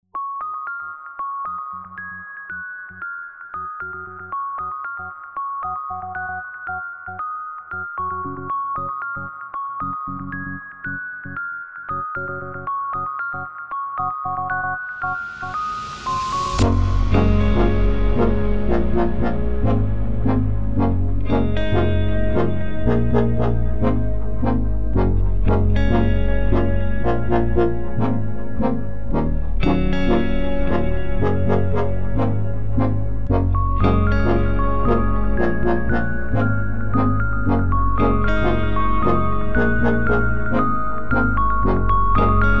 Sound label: JINGLE INSTRUMENTAL; AMONITE